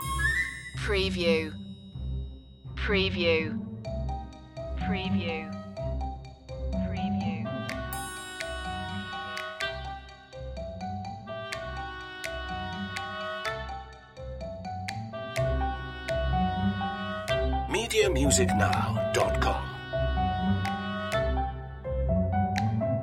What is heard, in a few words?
a quirky flourish loop